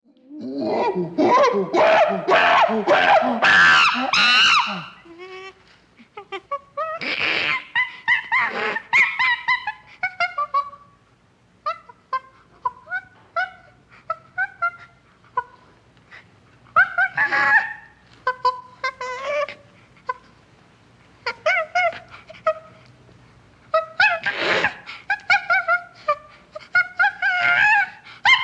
Chimpanzee, the distinctive pant-hoots of a chimpanzee; Chimpanzees